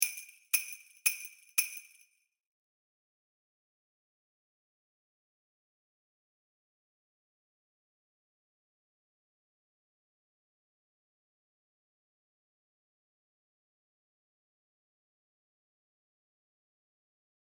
Tom Dooley Bb Clarinet; Duets Clarinet Saxophone

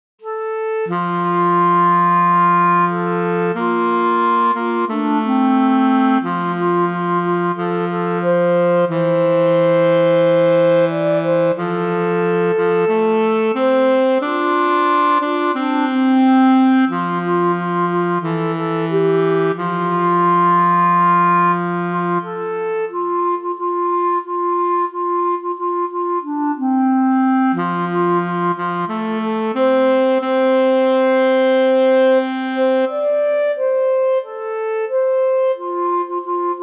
Swing Low Sweet Chariot Duet, Clarinet Saxophone; Duets Clarinet Saxophone

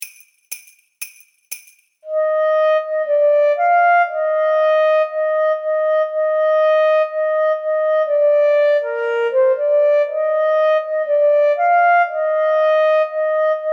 Bb Clarinet; All Around My Hat

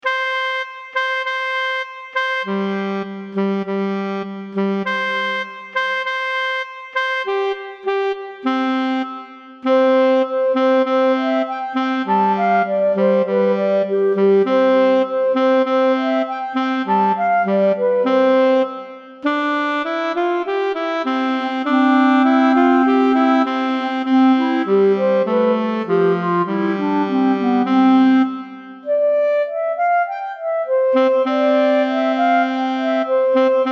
Duet, Clarinet Saxophone; Early One Morning

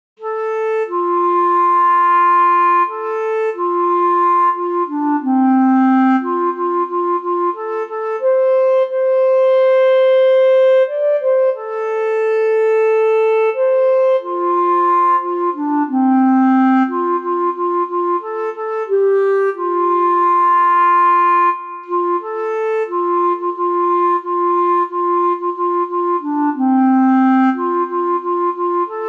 Swing Low Sweet Chariot Bb Clarinet; Duets Clarinet Saxophone

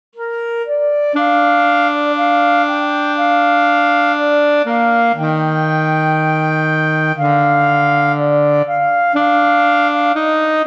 Duet, Clarinet Saxophone; Michael Rowed the Boat Ashore

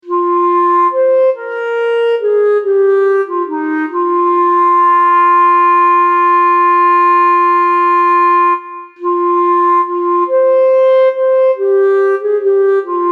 Bb Clarinet; Scarborough Fair

